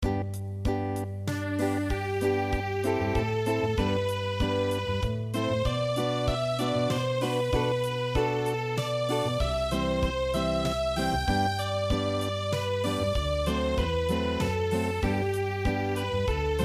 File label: Unplugged, midi; Massig und sehr innig